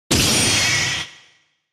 great clash of sword

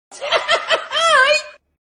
hahahayyy

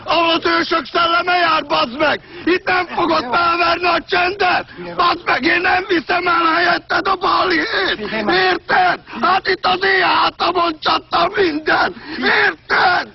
epic rage

